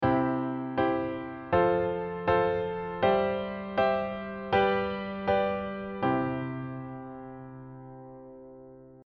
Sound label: inversion, accompaniment inversion